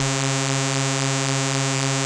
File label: BASS C4; JGL BASS; Jungle; USB Soundscan vol.05; Explosive Jungle; AKAI; 90s sample CD